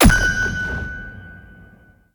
piano hit

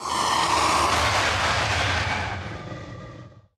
jump fireball voice

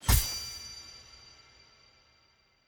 cello g

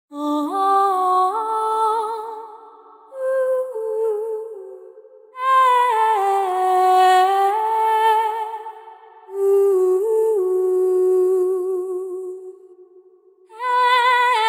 chanting loop

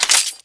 umbrella shake

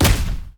imp evil hammer

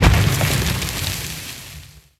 fireball

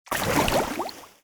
dive bubble

